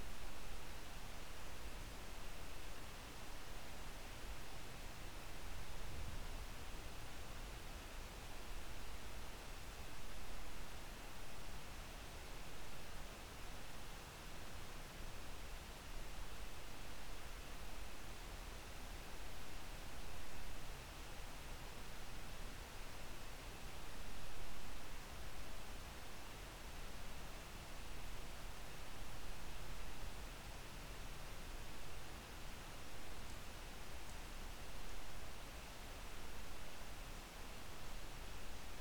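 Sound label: hydrophone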